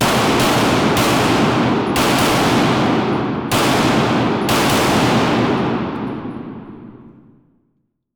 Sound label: weapons